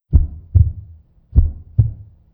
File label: tree heartbeat